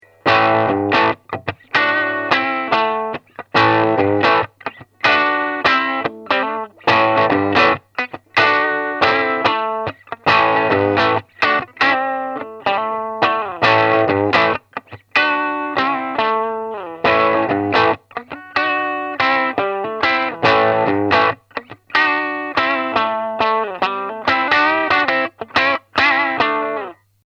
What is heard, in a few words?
Clean